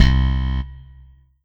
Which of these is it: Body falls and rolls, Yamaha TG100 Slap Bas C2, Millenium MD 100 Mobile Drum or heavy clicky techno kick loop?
Yamaha TG100 Slap Bas C2